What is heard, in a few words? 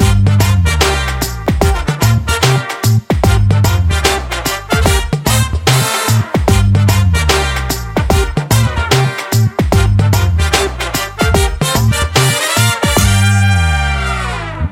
Instrumental Tone